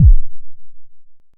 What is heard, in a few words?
analog deep, Kickbank